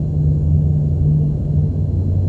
inside hum; Dystopia; vaccine

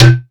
plastic impact, Bullet Impact; Dystopia; physics

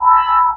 med tone; Dystopia; implants

